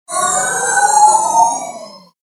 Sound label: agressive motion